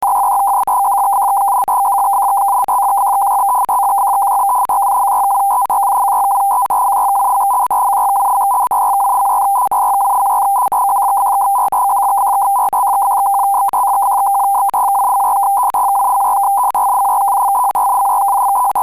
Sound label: pactor